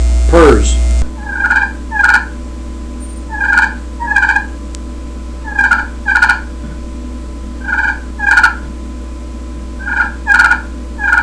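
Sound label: triple throat aluminum purrs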